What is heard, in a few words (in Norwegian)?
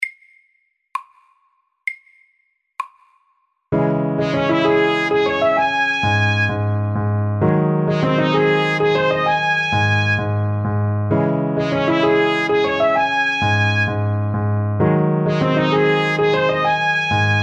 Prelude i Ess moll SAKTE